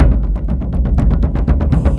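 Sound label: Music Shield; From Dust; ps3; xbox 360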